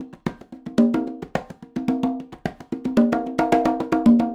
110 Dance Congas; Percussion; CongaBongo; Pro Tools Essential Loops; Digidesign; Dance DJ

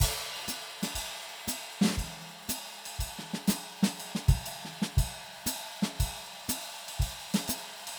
120 Trad Jazz Drums; Pro Tools Essential Loops; Digidesign

